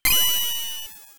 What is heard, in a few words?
enemy drain; SNES; SMRPG